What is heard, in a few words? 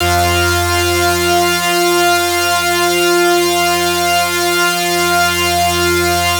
Lead Shot F, Dubstep; One Shots; Harmless; Ghosthack; Dubstep Sound Arsenal